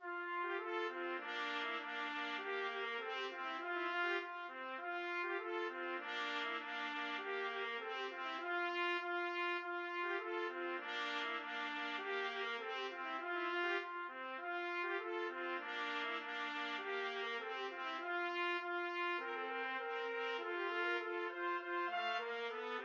trumpet duet

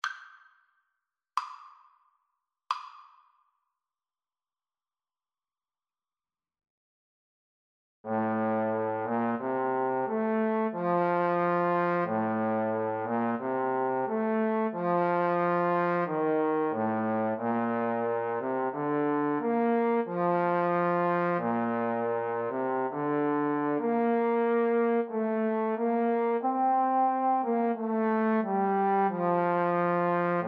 jodler, Trombone Duet

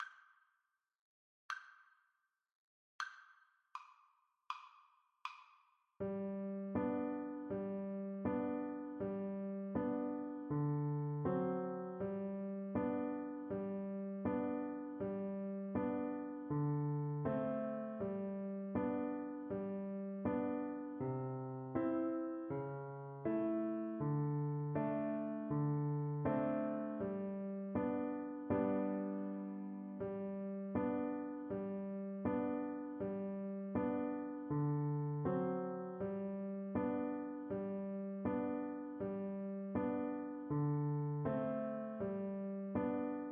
yankee doodle, Flute

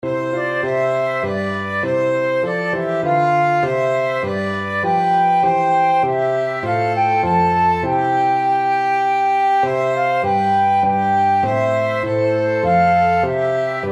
flute, violin flute duet; midnight clear

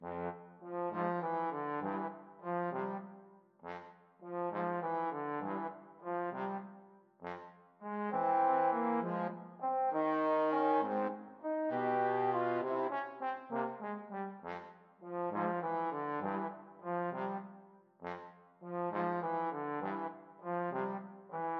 trombone duet

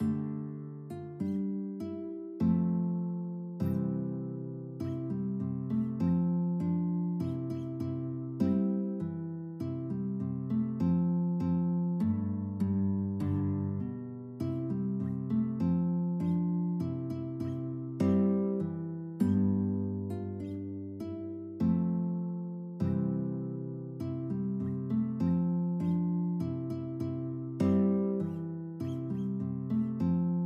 guitar duet

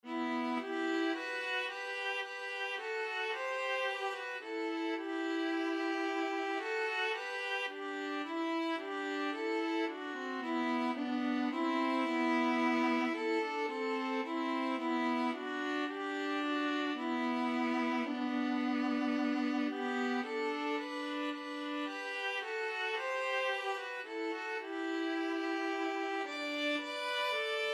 violin viola duet

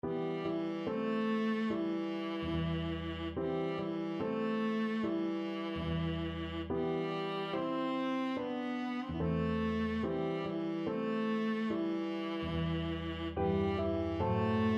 volga boatmen, viola

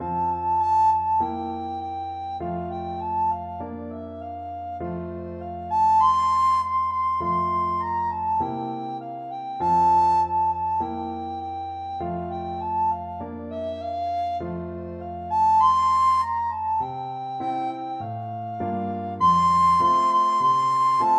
recorder

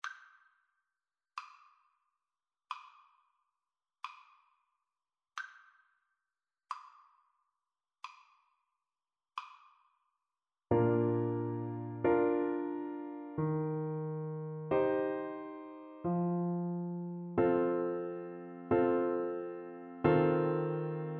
romance, Clarinet; beethoven op50

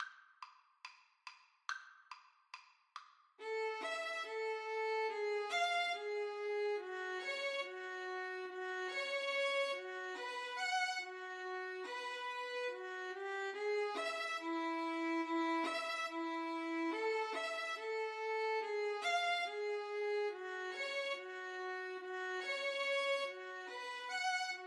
rag violin duet

